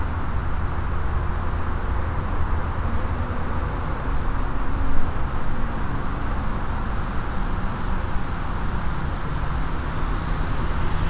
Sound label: engine B hi